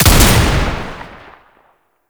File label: Bayonet Fire; Warframe; Tau; Roathe; Weapons